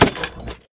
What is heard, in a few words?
grenade launch